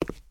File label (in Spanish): Paso zapato cemento; yesterday origins gamerip 2016 switch ps4 pc ios macos